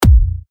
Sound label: TECH KICKS, A#; Bonkers; Revealed Techno Kicks Vol. 10